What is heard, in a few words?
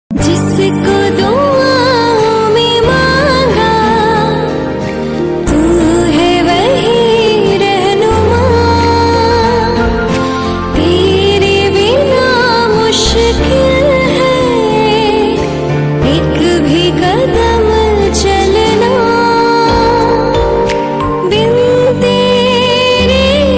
Love Song Ringtone